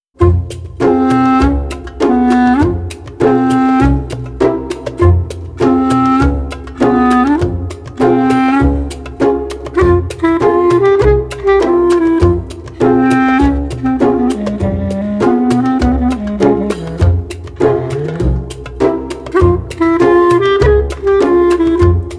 sweetness pizz alaturka; by burak sound bank; cheerful vol 14

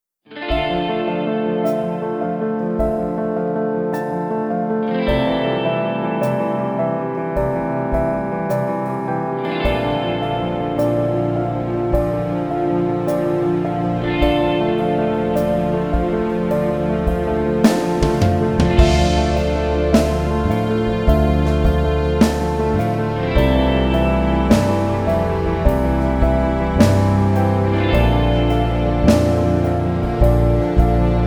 dramatic guitar drum comp; by burak sound bank; dramatic dram vol 17

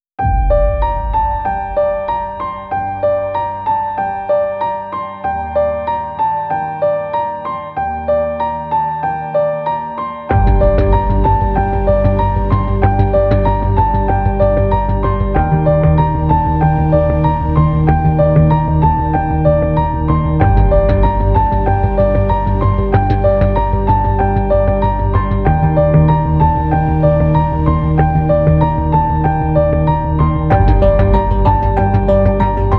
dramatic strings; By Burak Sound Bank

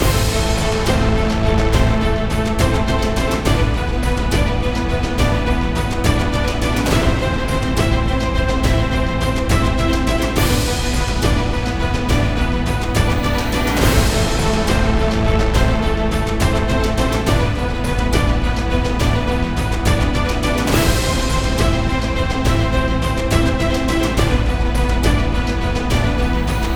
cinematic; by burak sound bank; tension gerilim vol 54

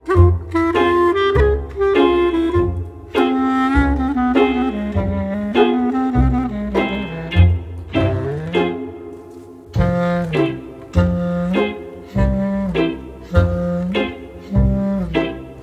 sweetness akustic guitar pizz; by burak sound bank; cheerful vol 14

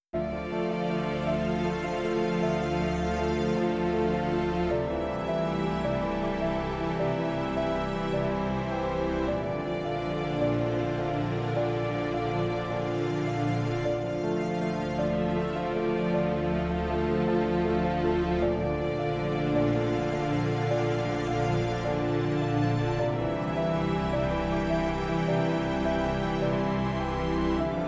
dramatic; by burak sound bank; dramatic dram vol 17

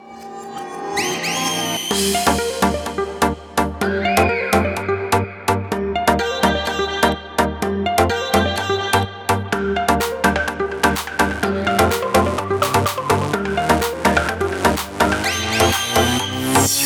house electronic techno; By Burak Sound Bank; house electronic techno vol 5

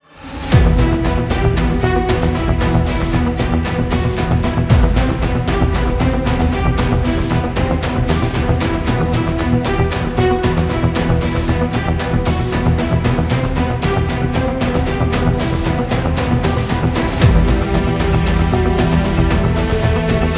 tension violin; gerilim; tension vol 4; By Burak Sound Bank

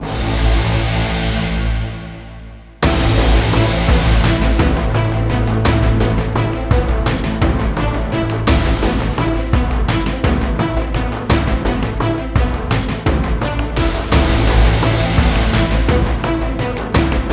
cinematic; by burak sound bank; tension gerilim vol 52

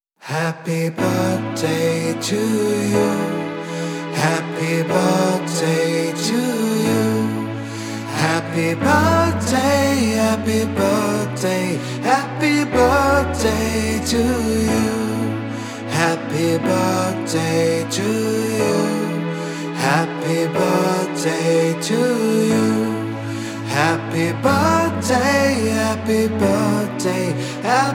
happy birthday to you; By Burak Sound Bank

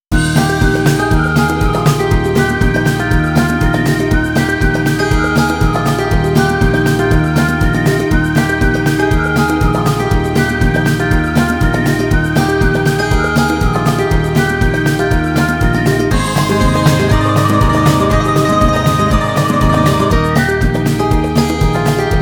sweetness whistle; by burak sound bank; cheerful vol 3

